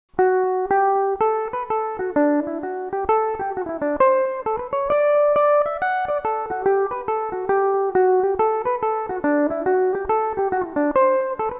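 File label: hornpipe D major, Irish mandolin; Belmuse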